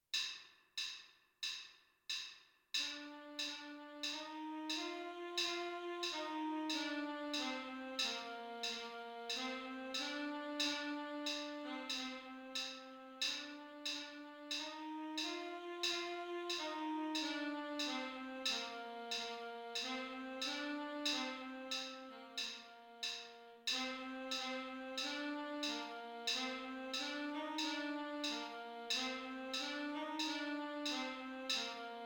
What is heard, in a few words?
tenor sax